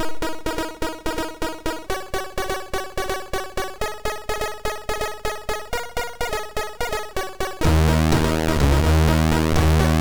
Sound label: Calm Intro sid MOS6581R2; Venom